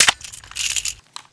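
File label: weapon foley mg4 reload hit clip; Call of Duty Modern Warfare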